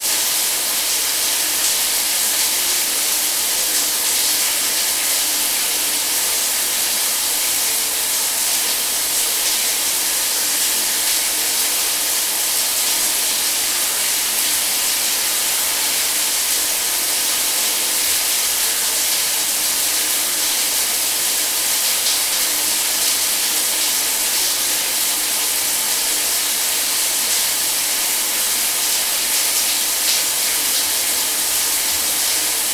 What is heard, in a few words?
household shower running room; sound effects one